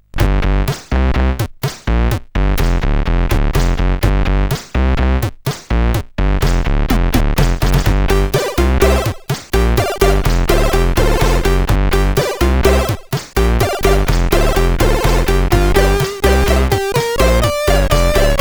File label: Punkyfunk, sid CSG8580R5